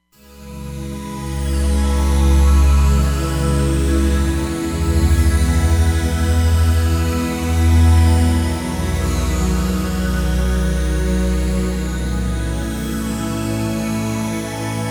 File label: Mash Pad; Roland Fantom XR